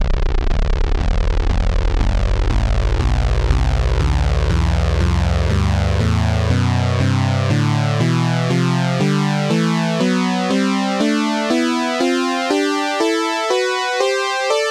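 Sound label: fifth sawtooth wave scale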